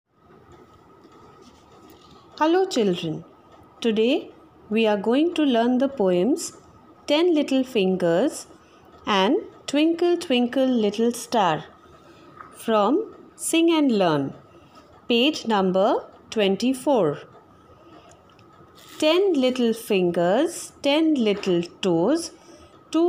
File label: Nursery Rhymes Ten Little Fingers & Twinkle Twinkle